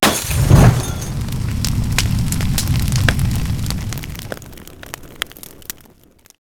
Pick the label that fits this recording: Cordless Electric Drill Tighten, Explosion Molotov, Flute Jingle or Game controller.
Explosion Molotov